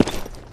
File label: footsteps, tile; player